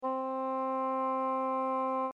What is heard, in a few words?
Oboe; Real Samples; oboe&horh